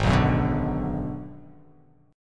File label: Ab Minor 7th Flat 5th Ab, down; strummed; JGuitar